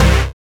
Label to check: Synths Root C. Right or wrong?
Right